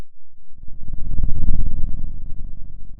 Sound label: Sound of a dinosaur